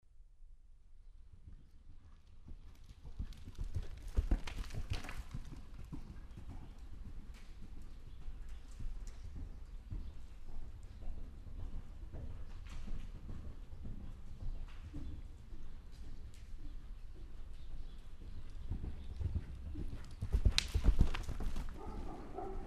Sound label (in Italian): cavalli, olofonic; olofonica; illusioni sonore